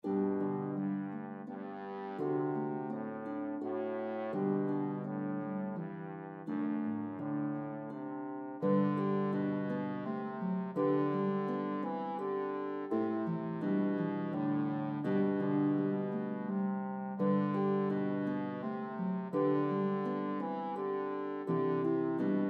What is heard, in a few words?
Trombone Harp; Duet for Trombone & Harp; French Lullaby; This French Lullaby is perfect for setting a calm, meditative ambiance.; Infant So Gentle